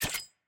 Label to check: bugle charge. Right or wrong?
Wrong